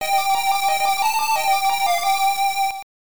Miss; Kiki Kaikai; TurboGrafx-16

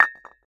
IMPACT Brick vs Brick mono; don t be afraid the first toy

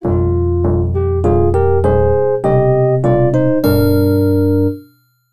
Death; Nobunaga no Yabou Zenkoku Ban; X68000

